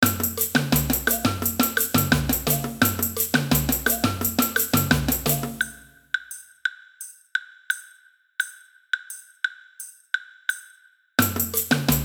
block, samba; samba band

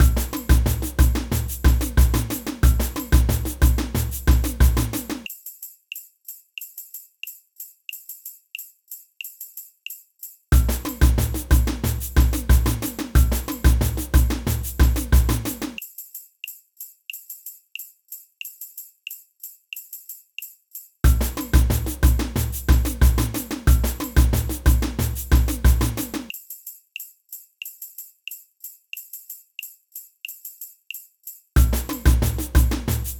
pandeiro; samba band

